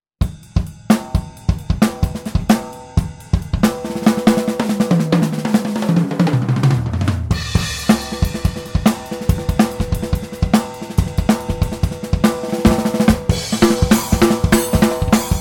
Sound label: Sound Sample Drums unprocessed